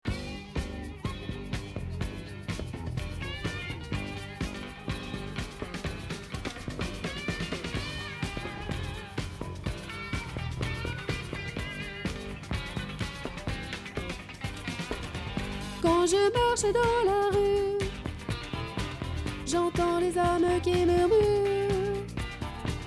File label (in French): instrumental voix